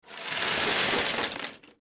bag of coins

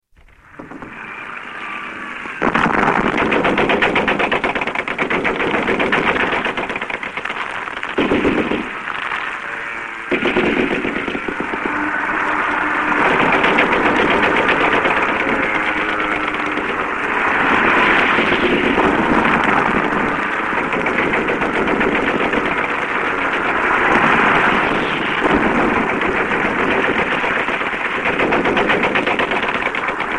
enemy aircraft

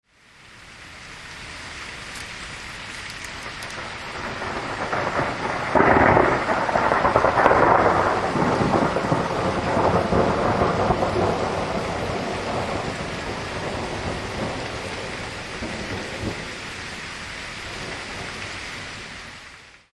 thunder rain

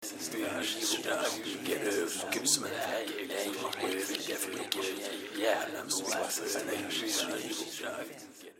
gossiping whispering

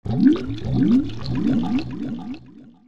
bubbles

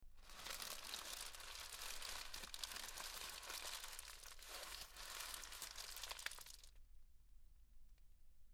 rustle

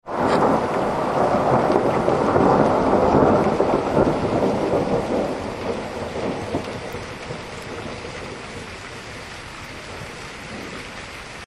thunder rain

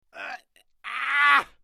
scream pain

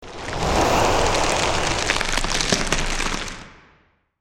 dragon fiery breath

